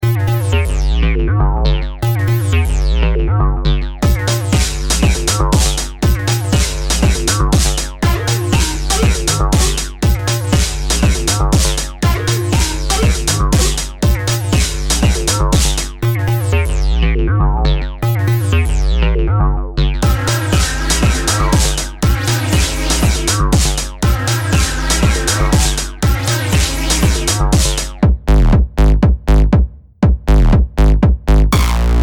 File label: acid bass, fat-ass synth